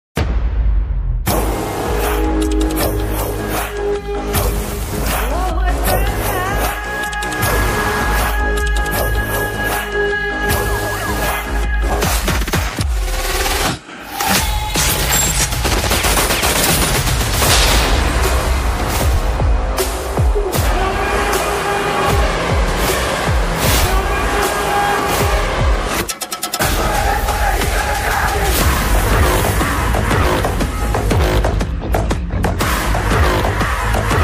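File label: Mass background music Ringtone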